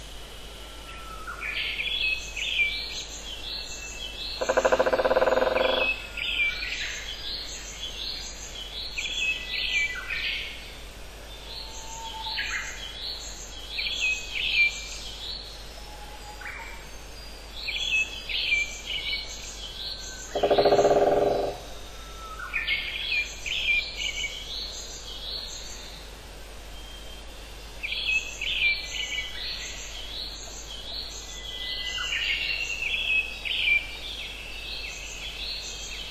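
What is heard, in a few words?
drumming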